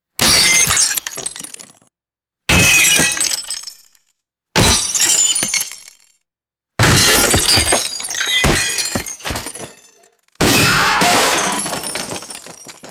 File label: thick glass smashing and shattering